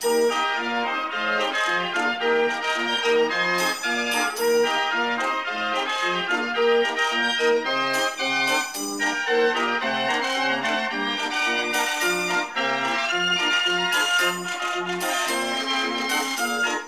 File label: MUSIC FAIRGROUND LP